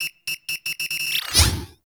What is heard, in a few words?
Grenade Countdown; Warframe; Caliber Chicks Minigame; Bomber